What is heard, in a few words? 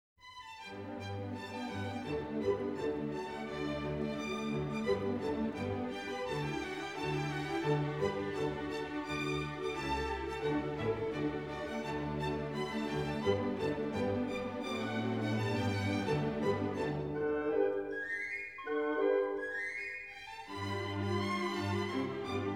opera; K. 620